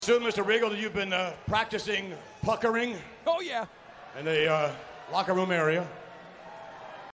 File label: practice puckering